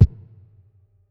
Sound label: Kit, breakcore Drum Hits